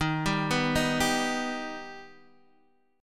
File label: D sharp Augmented D sharp, Guitar, Open D; x,6,5,5,6,5